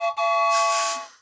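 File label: steam locomotive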